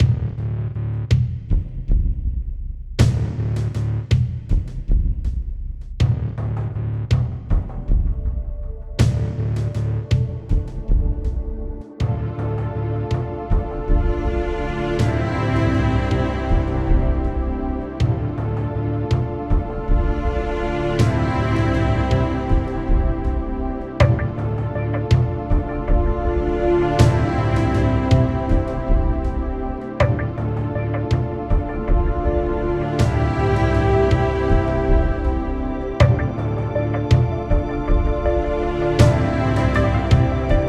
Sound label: Barracks Music